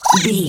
goblin fairy attack fast

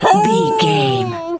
wolf hurt

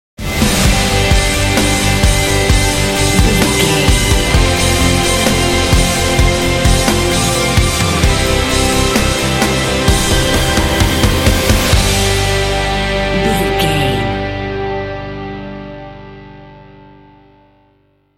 anthemic ocean waves sting

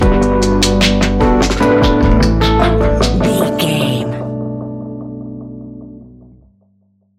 A relaxed and chilling piece of music; A relaxed and chilling piece of music, great for light documentaries, background music for tv shows, lift; stinger

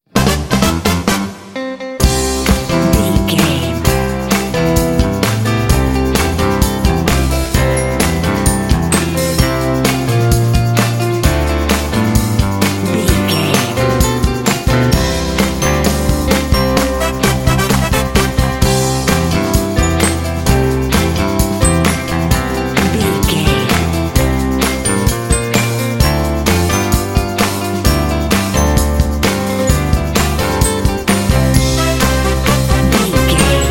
60s tv cheesy theme song

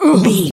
voice female hurt pain

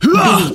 voice male attack groan

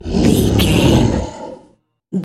troll monster effort growl small

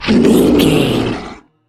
troll monster battle groan

